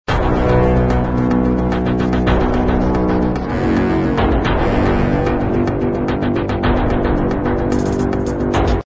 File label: Sci Fi Epic Background loop